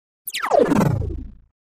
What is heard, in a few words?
Chopper Digital Helicopter Wash with LFE